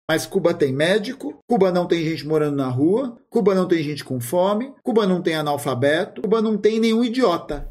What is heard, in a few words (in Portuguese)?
chorando